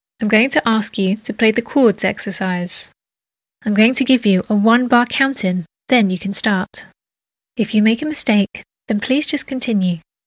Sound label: chords